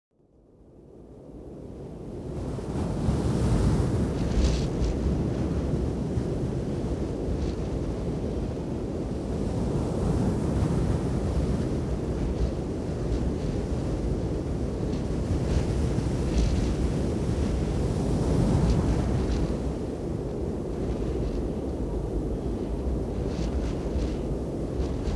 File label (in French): bruitage vent